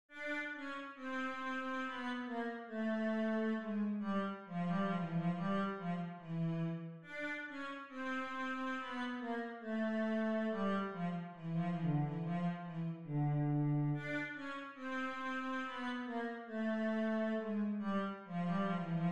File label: habanera cello